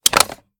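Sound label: sniper reload empty fast mag out; gmod; iw8; xmike109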